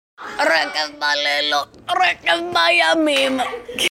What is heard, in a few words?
Trance